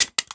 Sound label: mag smg